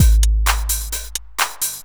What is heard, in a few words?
130 trance break, Drum & Perc Loops